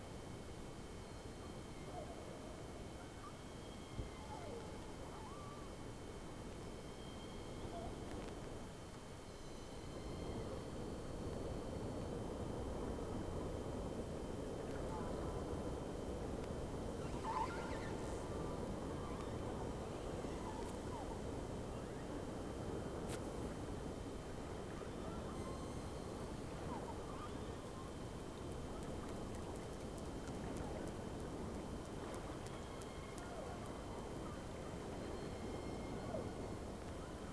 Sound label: Whales; SeaSnake